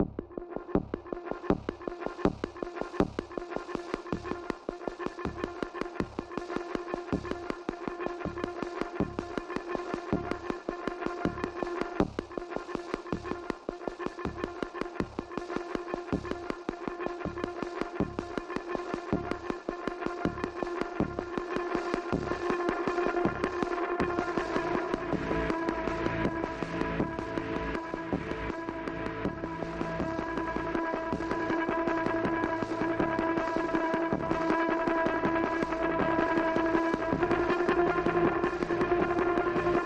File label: Curtains Falling, Pulses Only